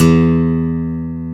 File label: GTR 6-STR, Steel String; Roland LCDP02 Guitar and Bass